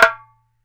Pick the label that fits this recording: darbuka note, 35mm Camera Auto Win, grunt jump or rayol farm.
darbuka note